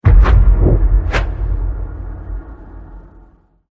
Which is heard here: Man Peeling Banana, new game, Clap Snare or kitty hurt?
new game